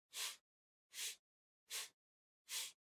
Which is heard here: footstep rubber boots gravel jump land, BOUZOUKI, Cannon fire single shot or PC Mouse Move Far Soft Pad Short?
PC Mouse Move Far Soft Pad Short